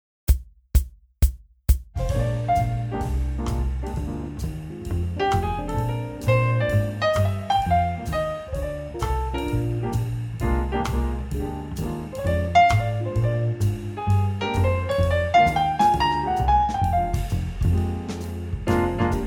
piano i chord swing; transcribing solos

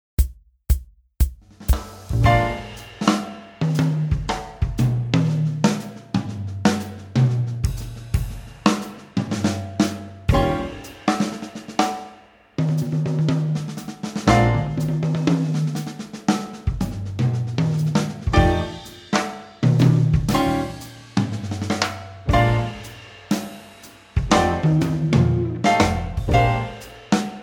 drums blues swing; transcribing solos

